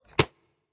arrow impact light; SoundsCrate

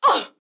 woman injured grunt; soundscrate

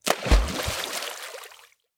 heavy splash

